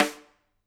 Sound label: Rim, drum